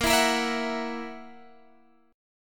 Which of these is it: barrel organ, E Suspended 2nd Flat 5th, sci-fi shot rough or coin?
E Suspended 2nd Flat 5th